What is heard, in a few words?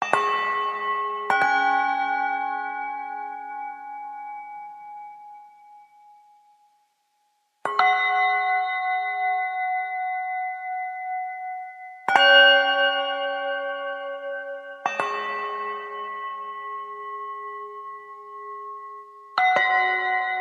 Village Bell; The Unfinished Swan